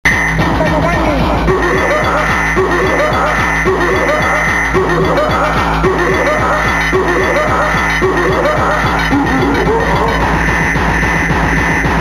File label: BEAT